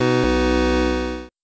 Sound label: Shutdown; Windows Never Released Soundpack 1; deviantart